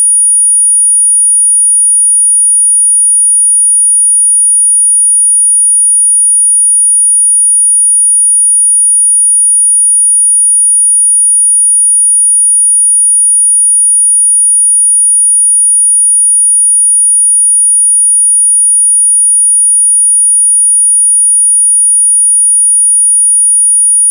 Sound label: sine 9k6